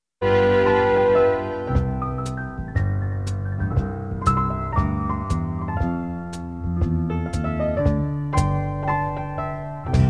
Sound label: Jingle